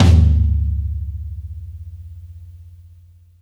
long tail reverb tom one shot d key, tom sample tuned to the D note. Loudest frequency: 216Hz; tom drum